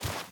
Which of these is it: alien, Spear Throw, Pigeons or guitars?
Pigeons